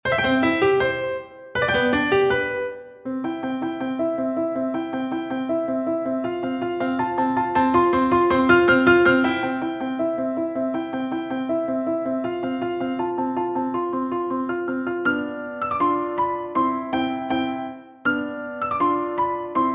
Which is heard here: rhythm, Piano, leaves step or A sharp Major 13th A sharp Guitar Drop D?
Piano